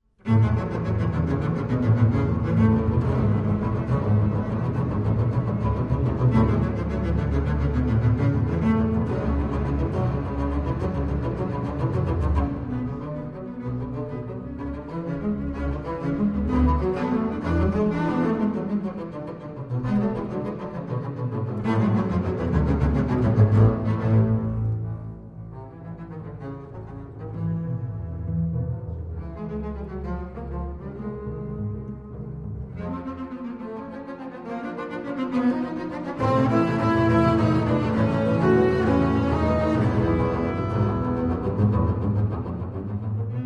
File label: Quartet for double basses